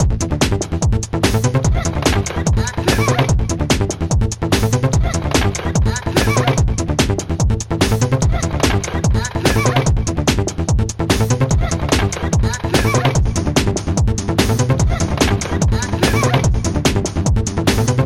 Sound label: RINGTONE